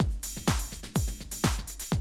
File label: 125, Classic Drum Loops